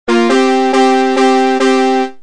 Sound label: fire